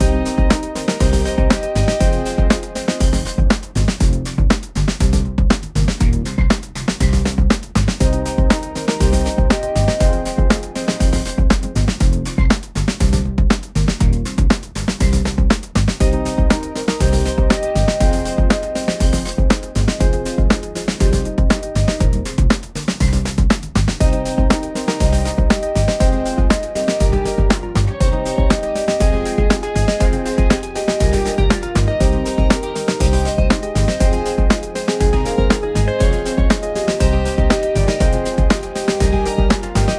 funky upbeat track with p

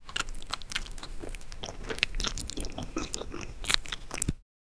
a cat eatting apple

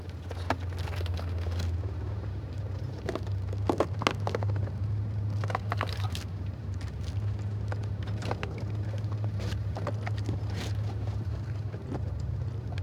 dragging a small wooden, grains

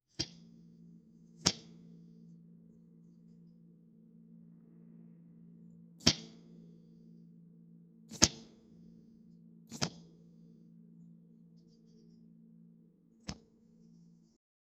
cartoon bow shooting sound

